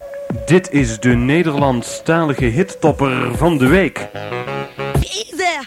jingle Nederlandstalige hittopper; Hitparades

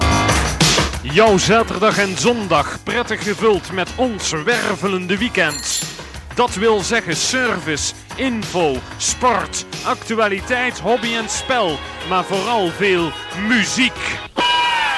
Jingle Wervelende Weekend met meer muziek

